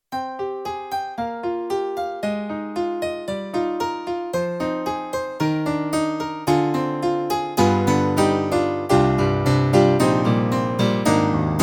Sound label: YAMAHA MOTIF-RACK ES House Piano